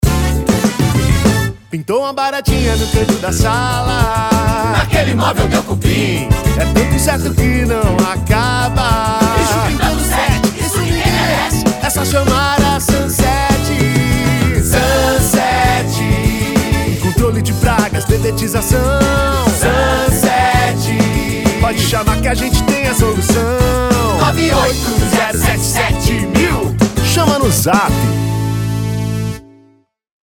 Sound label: Jingle Mix